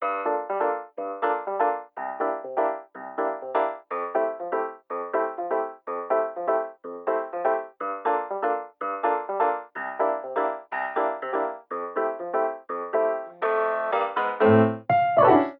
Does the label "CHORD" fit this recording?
Yes